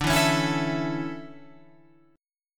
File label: D Major 7th Suspended 2nd, Guitar Open F; strummed; JGuitar